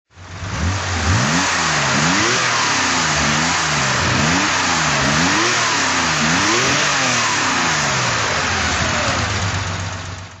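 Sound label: porsche 911 2.2 t throttle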